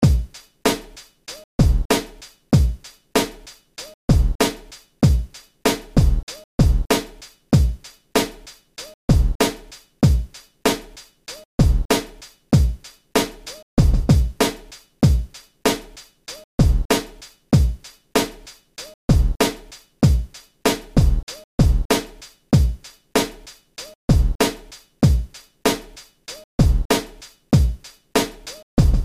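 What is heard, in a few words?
orchestral beat 96bpm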